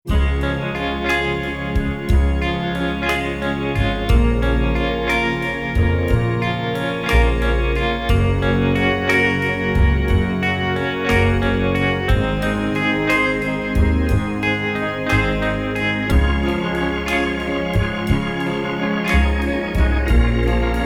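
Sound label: 50s Music Mix Guitar Organ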